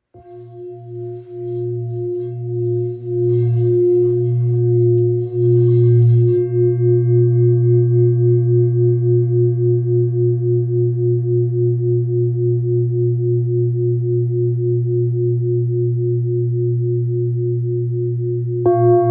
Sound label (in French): Bol grave la diese 1.79kg; om fleurdevie